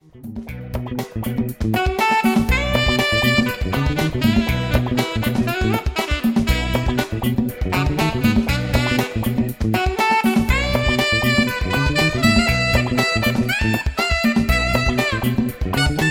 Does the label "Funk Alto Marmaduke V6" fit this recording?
Yes